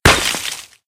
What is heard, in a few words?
Ice Hit; Angry Birds Seasons